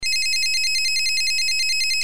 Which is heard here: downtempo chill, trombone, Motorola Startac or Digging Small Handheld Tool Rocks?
Motorola Startac